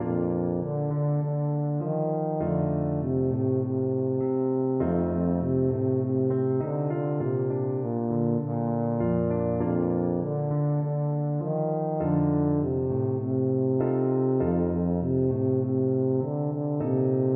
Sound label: tuba